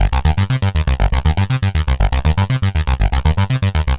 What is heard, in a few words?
Arpeggio Bass, Prototechno